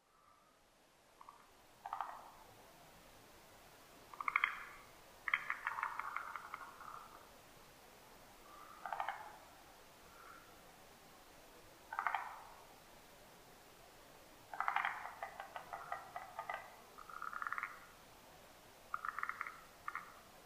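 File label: forest green tree frog; moriaogaeru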